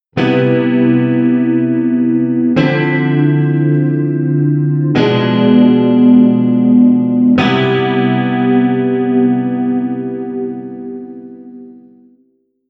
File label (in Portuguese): Cadencia C7M Dm79 Bo C7M